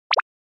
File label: Motion Pop